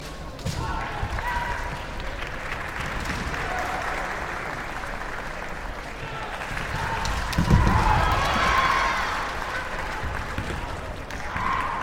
mens Kendo

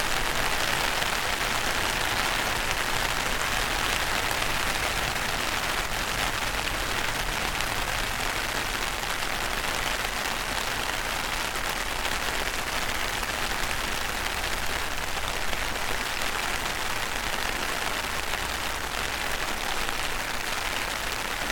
plastic umbrella rain

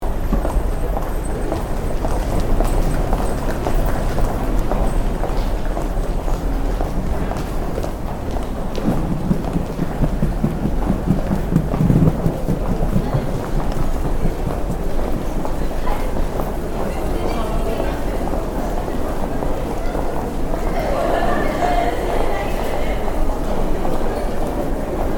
station evening

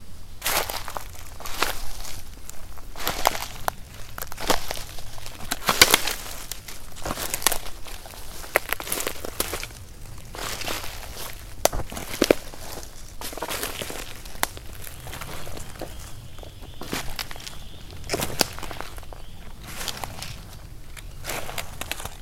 walk in forest

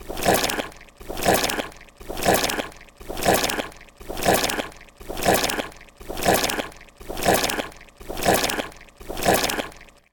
dressing; horror

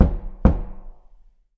knock on door; knocking

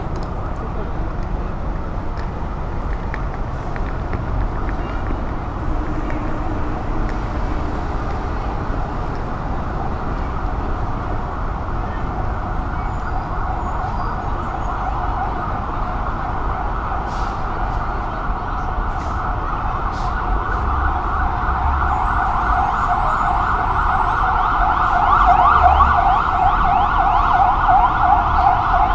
ambulance NY

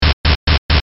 Jrpg stairs

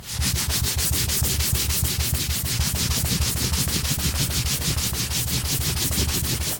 rubbing tatami

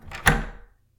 turn classic key

